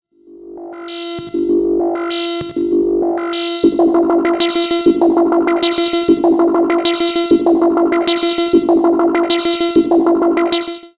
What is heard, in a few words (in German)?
MOOG Mavis Sequence durch Black Hole DSP Externer LFO in FOLD